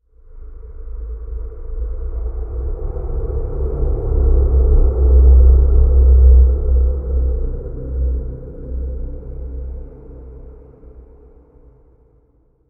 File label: environment graveyard stinger winds short